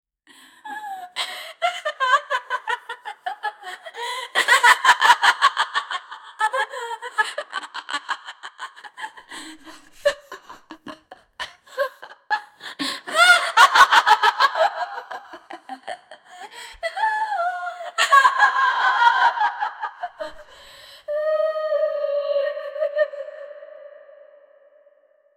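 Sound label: evil sinister female laugh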